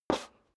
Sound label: step high heels metal rattle slide short